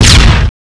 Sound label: boss boom